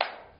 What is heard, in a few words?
Basement, Impulses